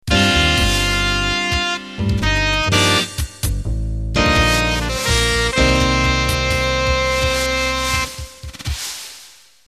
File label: Big Band Slow End